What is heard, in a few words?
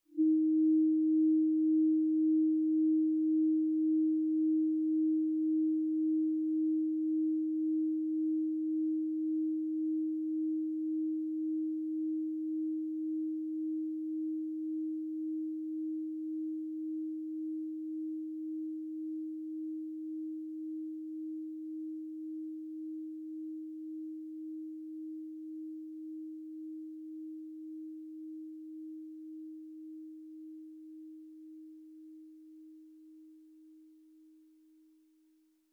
singing bowl; peak band P02